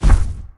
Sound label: move step; Swampmon; SwampOfDarkness